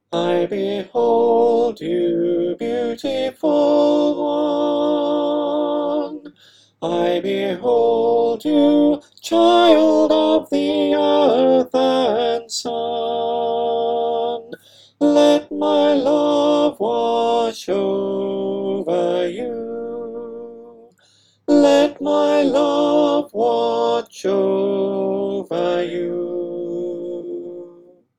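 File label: Tenor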